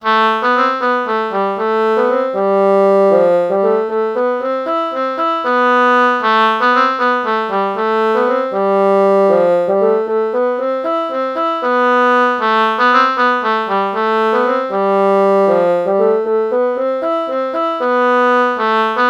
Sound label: oboe bassoon melody morph low